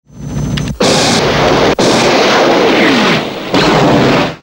Double Copperhead Fire expl; Airwolf

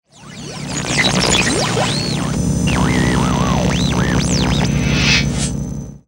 Radio Interference; Moffet

